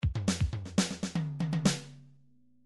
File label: drums fill, midi drum patterns